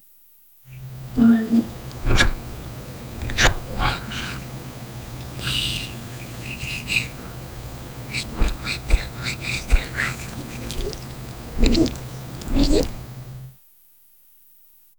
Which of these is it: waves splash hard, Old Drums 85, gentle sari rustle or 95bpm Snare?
gentle sari rustle